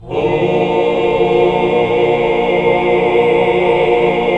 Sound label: HAL CHRD20EL, chords; Sound & Vision - Gigapack II CD 1 (Roland); HAL Greg.Chords1